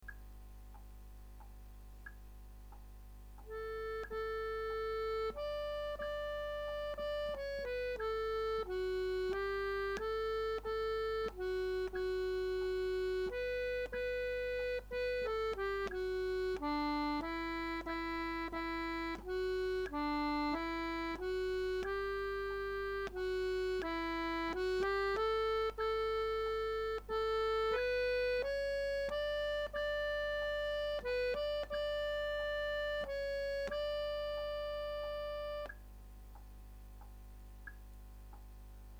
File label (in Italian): soprani